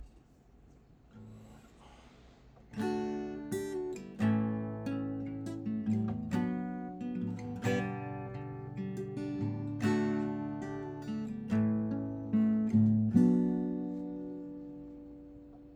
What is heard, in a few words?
Guitar No mic